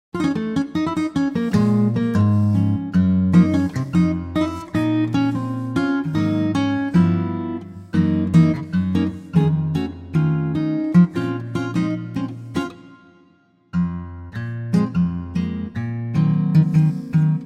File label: Bbm Ac Gtr Latin, male